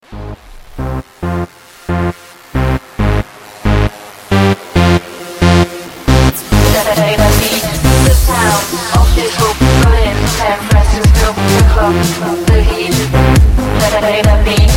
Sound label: Sound Of Disco